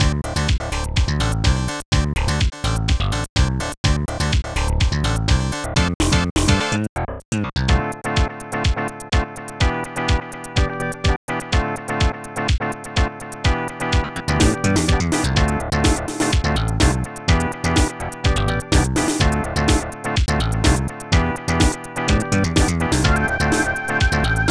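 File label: keygen song.mod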